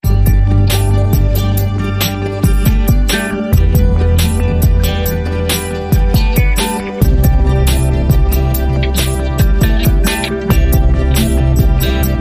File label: First Triad Sting